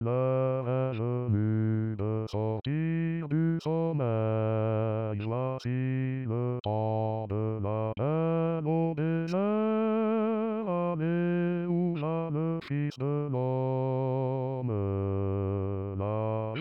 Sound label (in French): basse